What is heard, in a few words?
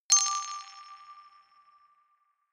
notification glockenspiel rattle fifth; instrumente